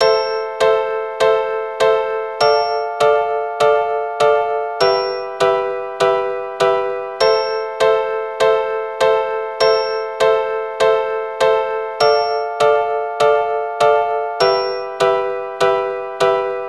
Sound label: Am Dm Em Am